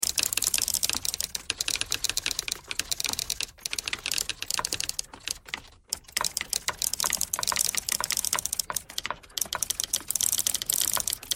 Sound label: typewriter thread all